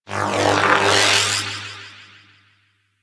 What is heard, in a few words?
Shield Electricity; Warframe; Volt